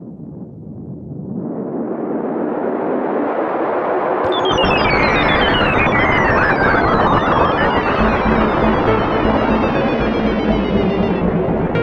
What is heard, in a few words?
electro